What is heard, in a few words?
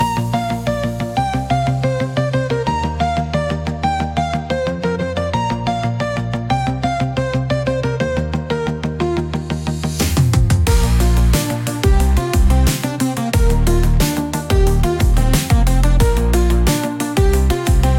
Pop